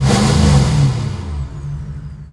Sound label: Humvee Rev; Trucks